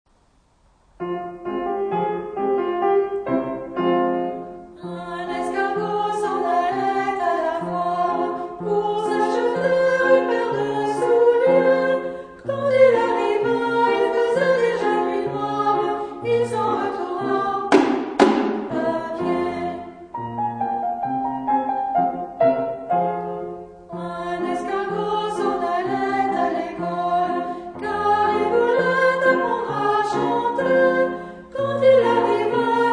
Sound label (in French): escargot chant